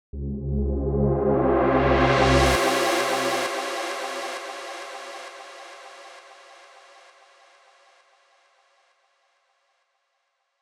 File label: 99 BPM F Min Chords; Cyber Collection; Vengeance